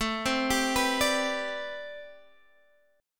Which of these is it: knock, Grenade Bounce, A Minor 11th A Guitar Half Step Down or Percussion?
A Minor 11th A Guitar Half Step Down